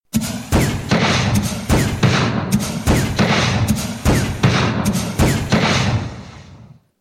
attack; Techies

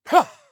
Assassin Grunt Slow; Realm Royale; Sly Scoundrel

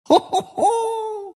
monkey laugh; monkey king

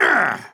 Grunt Attack; Paladins; Engineer

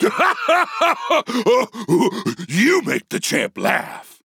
Laugh; Warrior; Paladins

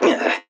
Grunt Hit; Paladins; Lex

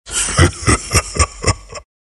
earth spirit laugh

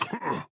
Grunt Hit; Grover

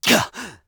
Assassin Grunt Hit; Realm Royale; Shinobi

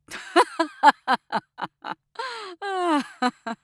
Hunter Laugh; Realm Royale

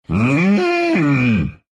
pain, ru; dota2

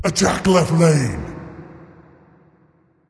Attack Dragon; Dread-Lord Fafnir

